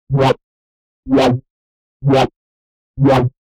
Wapp, break beat

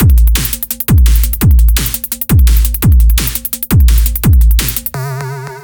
Micro drums B, 170 bpm

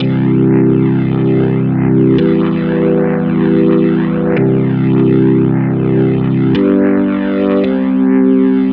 Jazz Organ; орган

